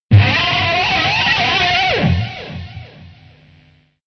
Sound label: slide; slide 2: distorted on a string